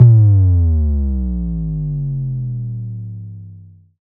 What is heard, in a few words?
Bass Drop; SoundsCrate